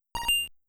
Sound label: Cash Register; mother1